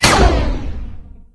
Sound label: fire, weapons; wheatley; Source Engine; Portal 2